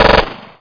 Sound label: Uzi Pistol auto; SpecOps2MPlayerDemo